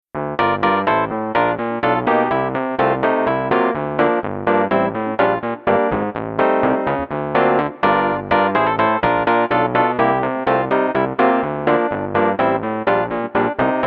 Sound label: Electric Piano 2